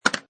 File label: Pinball Sound Clank With Recoil; Video Games (Sound Effects:Games)